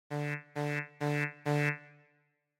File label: loop, yayli tanbur; instruments